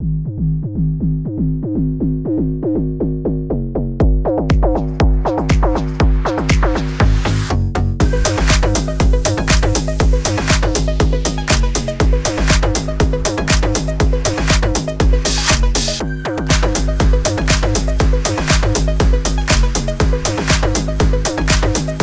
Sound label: BEAT 120bpm